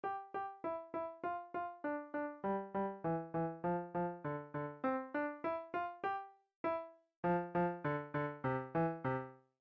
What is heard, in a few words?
Staccatto hands separately, piano